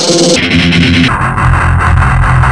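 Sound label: Distorted Guitar Rhythm; Amiga-CD 1996 #5-6; sampler; guitarsamples1; Amiga Magazin; Amiga 8-bit Sampled Voice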